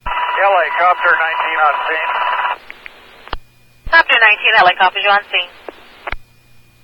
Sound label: Police Fire Ems Random